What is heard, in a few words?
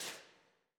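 choir "T", Voiceless; Sound & Vision; Gigapack I CD 2; CHO Vibr.+NoVoic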